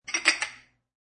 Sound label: A glass clanks onto another when stacked.; Glasses; SFXsource